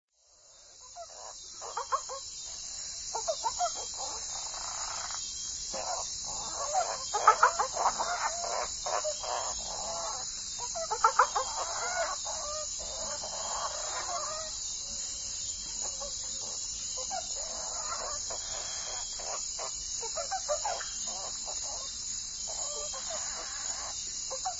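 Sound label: 3d sound frog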